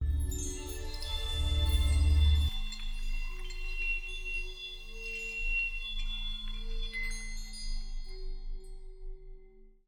UI Tutorial Marker loop; A Year of Rain

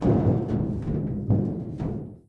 vent louder; Five Nights at Freddy's; FNAF 3

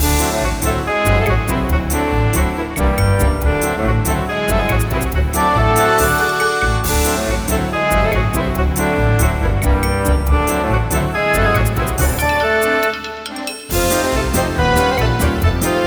Hit It ~ Surprised by Goblin [Jingle]; Drihoo

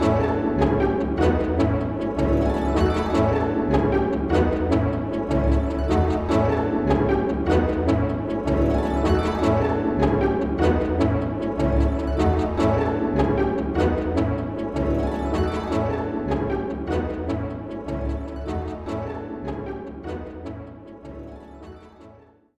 BGM Battle Result; pac man party 2010 wii gamerip

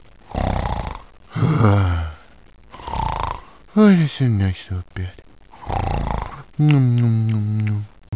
Snore; Garfield

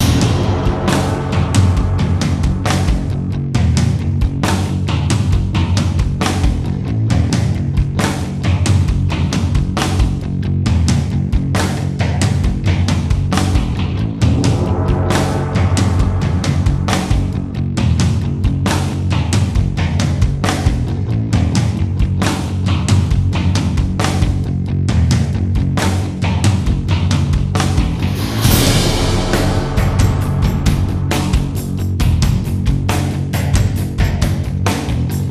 RESCUE ZETA BEAT, soundtrack

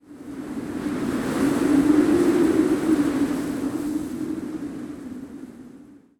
Ice Fields wind; ice age scrats nutty adventure

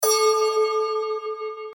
Buddah Bell

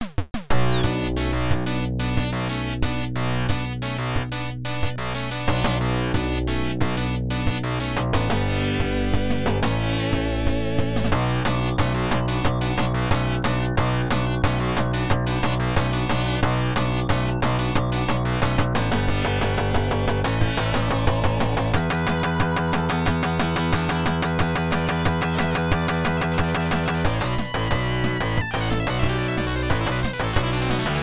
Rock; Emerald Dragon; pc-88

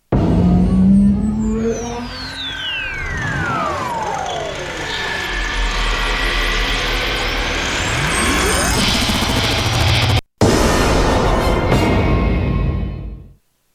Mission Completed; The Conduit; Wii; Pulled Inside

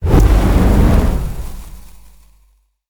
Firestarter activate; we are the dwarves gamerip 2016

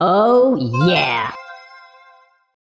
SFX Emoji fistpump; Golf Blitz 1.12

